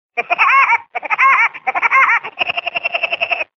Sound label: woodpecker; animals; woody woodpecker